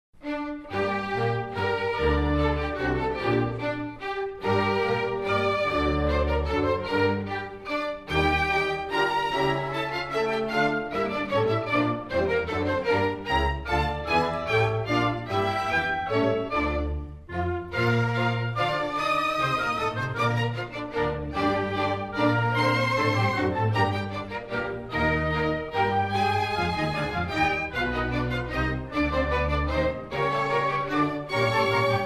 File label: Minuet Trio Symphony, Chamber Orchestra; Virginia Chamber Orc